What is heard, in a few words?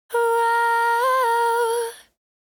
Ad Lib Dry, 140 BPM G Min, Vocal; Cymatics; Euphoria Vocal Sample Pack; Ad Libs